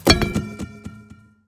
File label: Metal Ball Fall